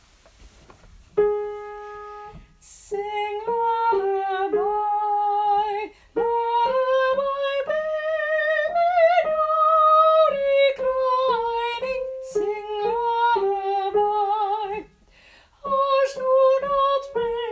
infant king sopraan

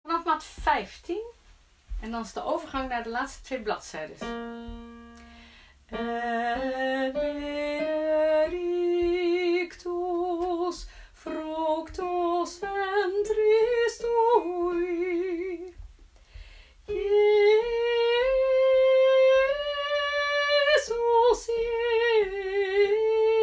Tweede stem; vanaf mt 15 tot eind

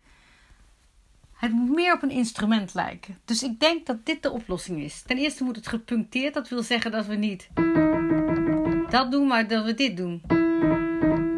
Voorbeeld tenor lead bas

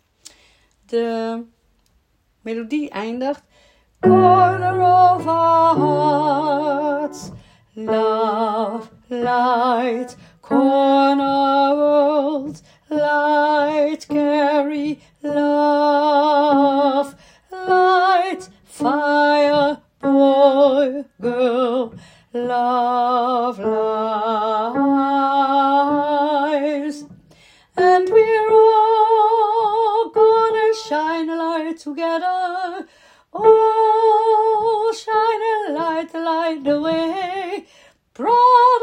Tweede stem

